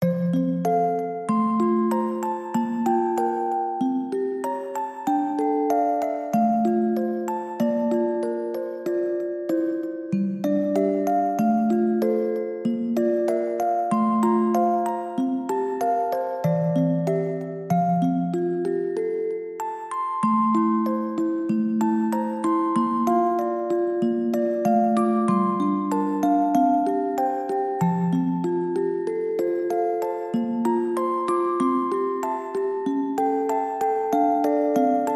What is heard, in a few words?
Granpa's Chair, orgel; Klonoa fan disc 2; kagerow